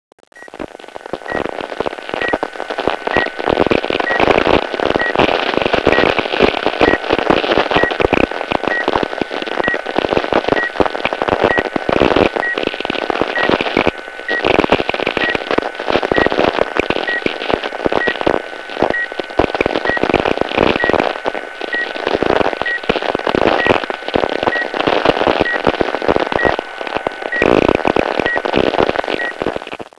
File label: tx high power 1 mile